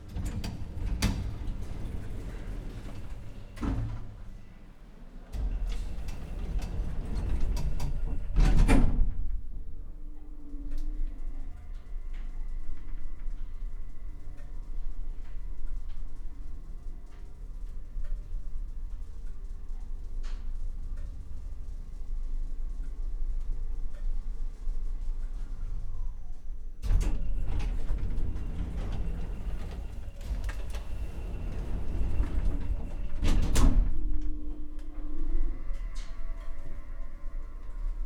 Elevator Rattling Creaky Door Slides Quiet Movement; Elevators; The Soundcatcher